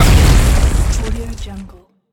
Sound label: grenade